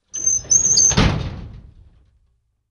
Drawer Metal Close

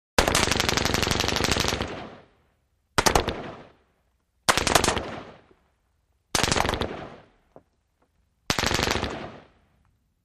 M-16: Rapid Fire With Impacts. Stereo

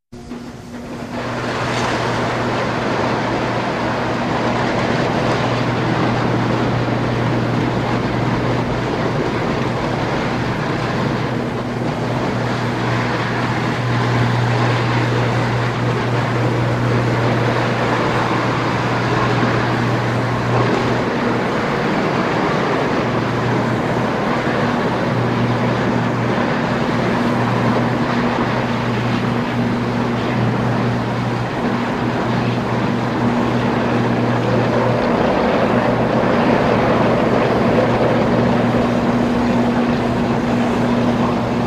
Smelter Venezuela, factory

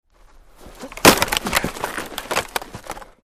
Football Block Grunt, football

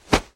Nylon Swishes Quick, whooshes & swishes

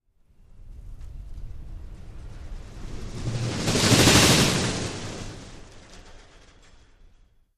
Roller Coaster Bys

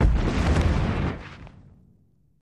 Dragon Falls, dinosaurs

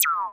laser; lasers

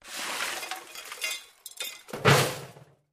Junk Swept Up By Broom Put In Garbage Can; brooms & mops

